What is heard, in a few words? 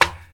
clap one shot E key